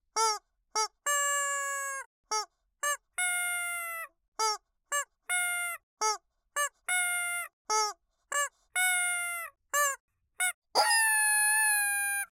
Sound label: taps chicken clucks; wonder-junction